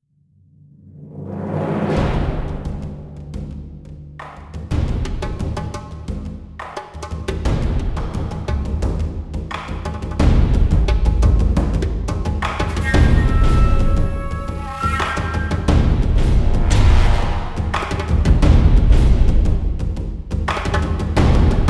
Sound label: combat opener